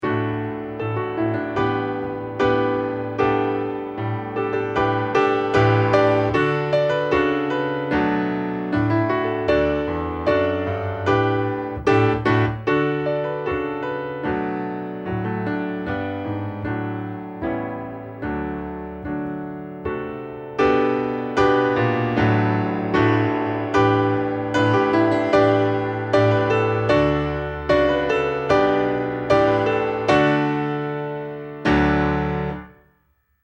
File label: accomp